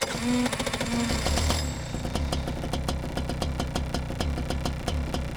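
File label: KART exterior STARTUP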